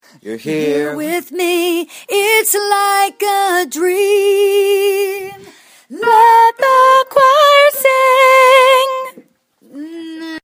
Verse 3rd above

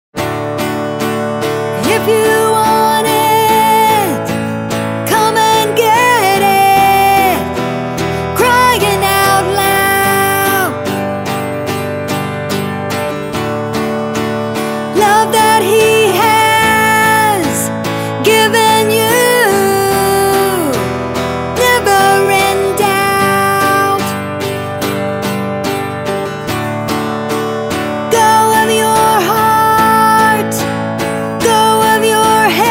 alto

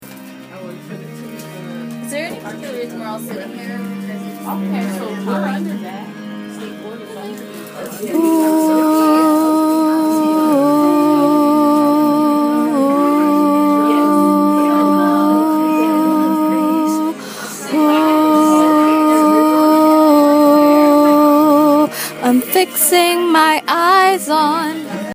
alto

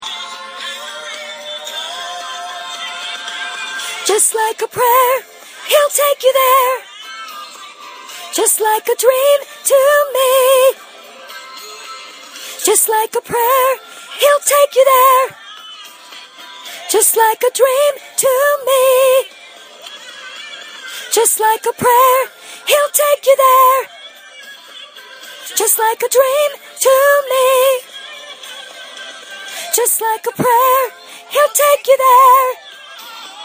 Bridge 3rd above

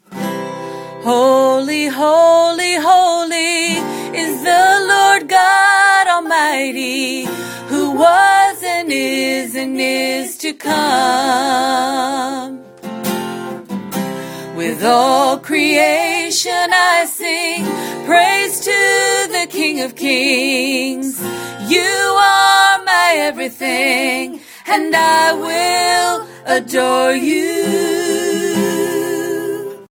Alto

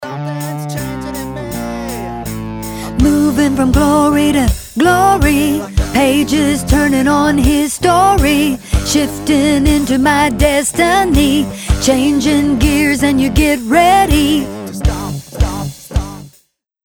bridge 3rd below

